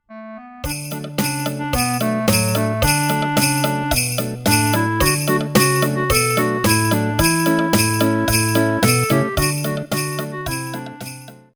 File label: bells; musical instruments for toddlers